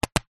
mains power plug plug into extension block socket australia; household